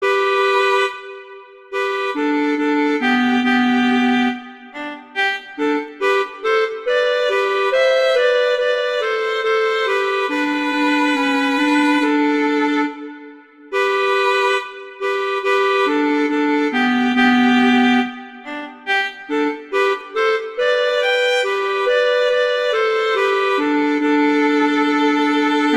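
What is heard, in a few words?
Duet, horn; Mozart; Duets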